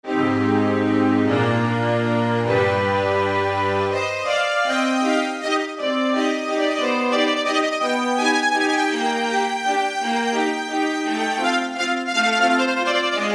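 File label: Tremolo Strings, Korg MSC-1S; Korg MSC-1S Variety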